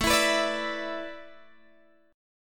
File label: A Suspended 2nd Suspended 4th, Guitar, Open G